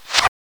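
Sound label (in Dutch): fluut, flute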